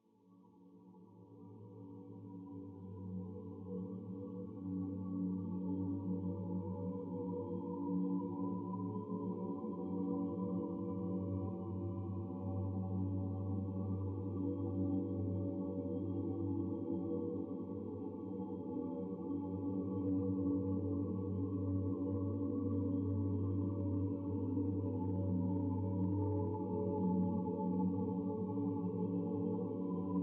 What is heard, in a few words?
Drone Ambience